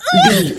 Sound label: voice female hurt pain